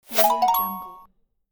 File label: positive action swoosh